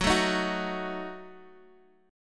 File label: F sharp Minor 6th, Guitar Open G; chordshape; down